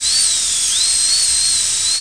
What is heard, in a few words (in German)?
bohrer